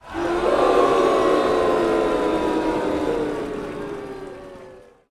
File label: Boo; A.C.E.; Wii U